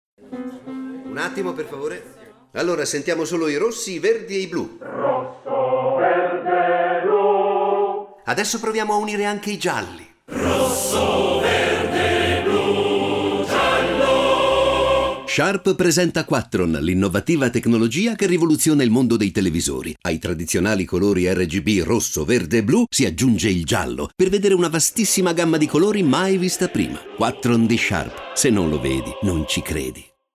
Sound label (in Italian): CORO; SHARP R30 Quattron